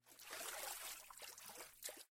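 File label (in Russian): накатывающаяся на берег волна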